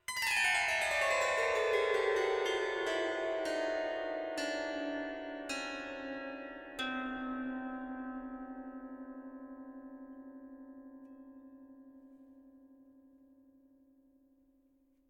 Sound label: Combat Zither Cluster; curious expedition 2 macos ps4 switch windows xbox one xbox series xs gamerip 2021